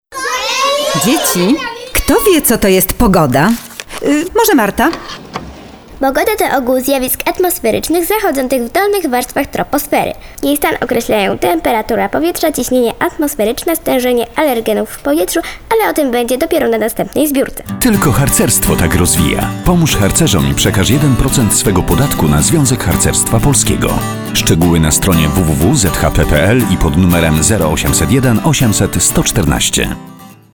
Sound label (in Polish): Pogoda z Gitara